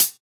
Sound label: Closed Hat; Ghosthack Advent Calendar 2017 Day 5